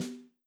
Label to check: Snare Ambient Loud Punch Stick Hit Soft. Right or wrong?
Right